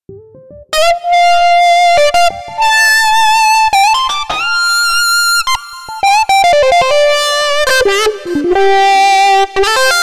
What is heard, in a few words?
Amp British